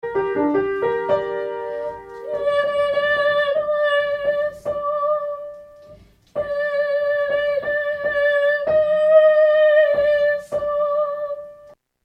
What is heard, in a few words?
Kyrie Tenor